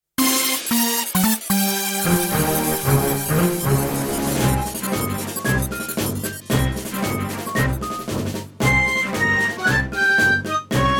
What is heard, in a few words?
Heavy Metal; Mecha-Bowser; Super Mario Galaxy